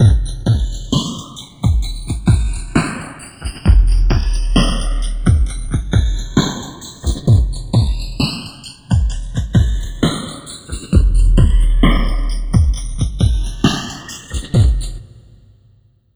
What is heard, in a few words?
FILTERS L, Dark Side Of TripHop; USB Soundscan vol.35; AKAI